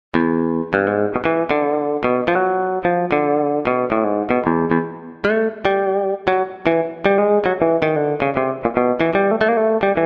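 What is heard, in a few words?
Clean Strat; TURBO VL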